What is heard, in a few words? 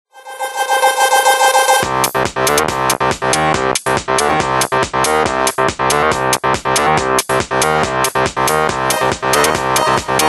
Ring Wavelength